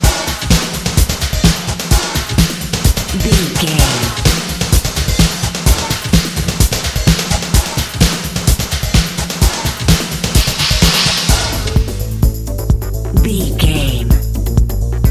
Dance, EDM, Club, Exercise, Aerobic, Aerobics, Spinning, Workout, Disco, Eurodance, Confident, Energetic, Cheerful, Happy; climb higher